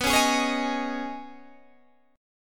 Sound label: C sharp 7th Suspended 2nd B